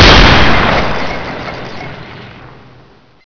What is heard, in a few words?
30 cal shoot